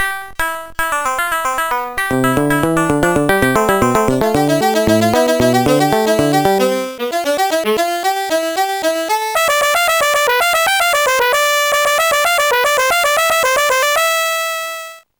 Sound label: oldtime folksong; Sonix Music Driver